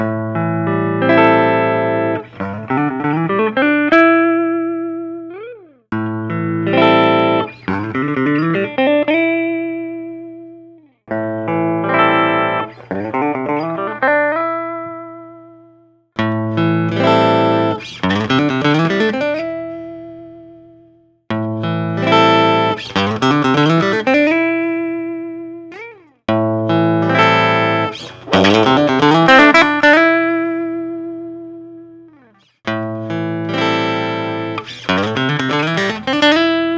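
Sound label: parker fly mojo midi taxi cab yellow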